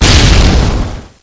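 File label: grenade explosion; cstrike; codmw2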